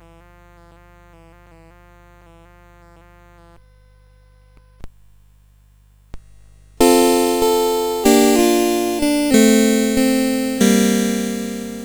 Gospel Song, CSG8580R5

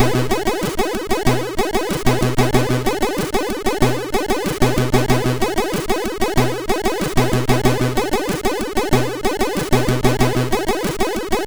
Relaxation, MOS6581R4

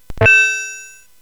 Tecnetium, CSG8580R5; hvsc

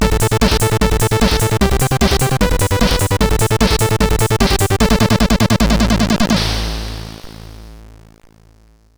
Trance, CSG8580R5; Trance Sector Ultimate

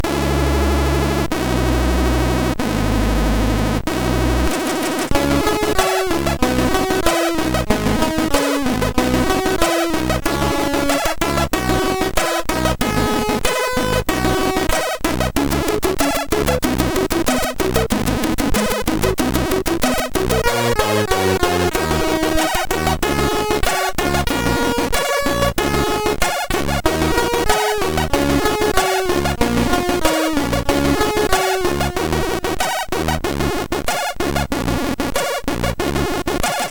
Party Tune, MOS6581R3; Party Tune II

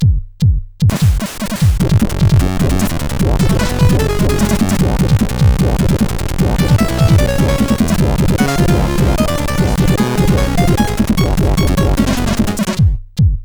Incidental sid CSG8580R5; Street Fighter II; hvsc

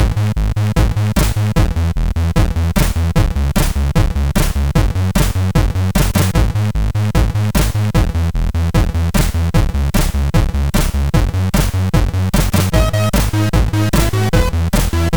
Tune, sid MOS6581R4; hvsc

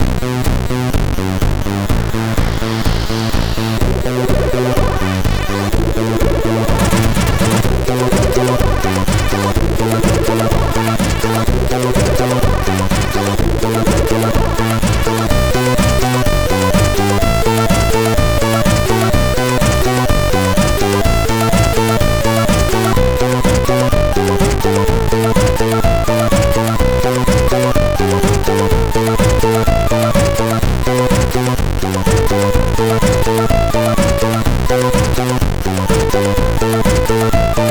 loader Remix, MOS6581R4; Old Elite

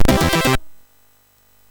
Jingles, MOS6581R3; hvsc

